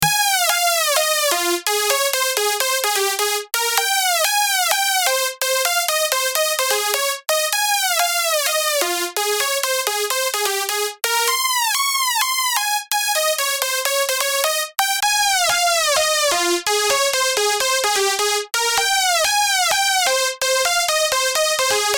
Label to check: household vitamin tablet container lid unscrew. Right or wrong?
Wrong